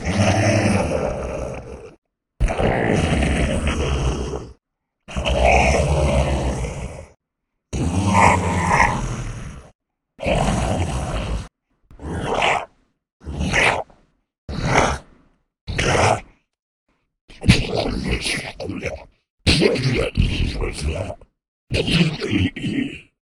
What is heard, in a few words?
Ghoul Ish Monster